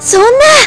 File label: pain; quake2; hottie